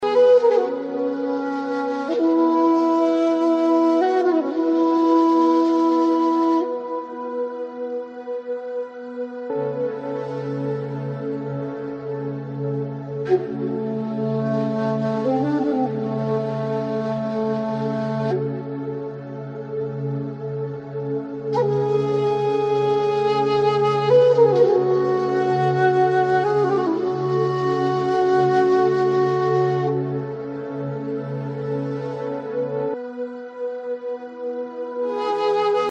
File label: flutes